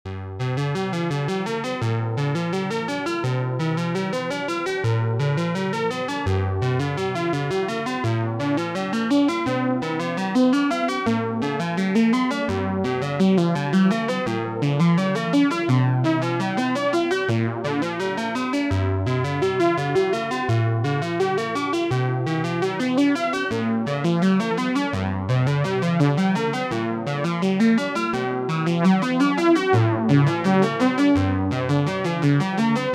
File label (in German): Komorebi mit Stereo Delay